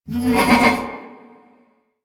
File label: Ram Grunt; Duviri; Animals